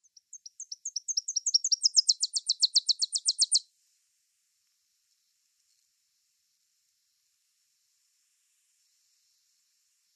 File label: Tennessee Warbler song